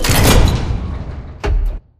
Delivery Cage In Stop; Boomtown; Titanfall 2

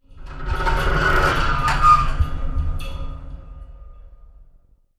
metal stress; Titanfall 2

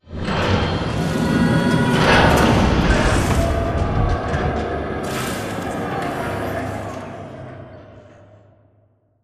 Arm Turn; Boomtown; BT Taken; Titanfall 2

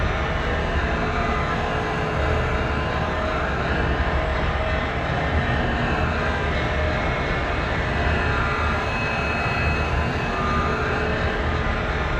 Assembly Giant Rotating Pillar; Boomtown; Titanfall 2

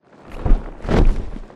flyer fly med dist; Titanfall 2

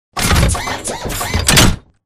dash backward; Titanfall 2

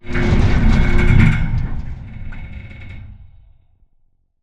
crane move stop metal stress; Titanfall 2

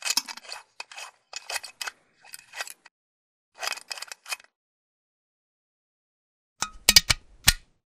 conductor canteen; Commander; baker; Titanfall 2

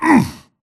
grunt hits; Titanfall 2

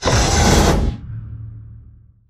sliding door ext verb open slide; Titanfall 2

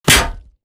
bullet heavyballistic titan; Titanfall 2

